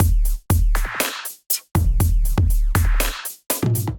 Kit, Drums Hybrid; Kindle Kit; Ableton; Factory Packs; Drum Essentials

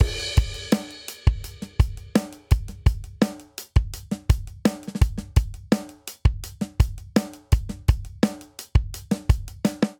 Straight Seven Rock Verse 7/8 84 bpm, Drum; Ableton; Factory Packs; Drum Booth

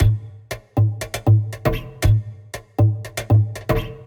Zither Percussion Groove 118 bpm; MIDI Clips; Drums; Ableton; Factory Packs; Lost and Found

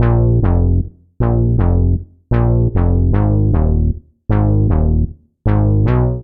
Bb Minor 77 bpm, Bassline; MIDI Clips; Tonal; Freak-o-saur; Ableton; Factory Packs; Chop and Swing

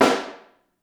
SN Room, Snare

